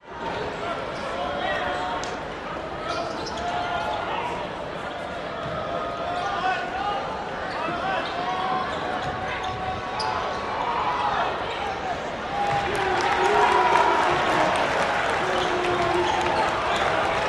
College basketball crowd chatters with tennis shoe squeaks in background.; Cheer, Crowd Crowds, Gymnasium Sports, Basketball